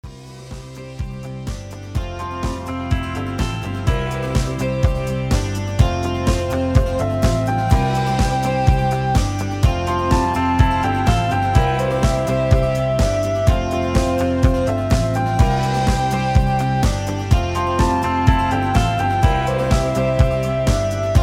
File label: Instrumental Hoedown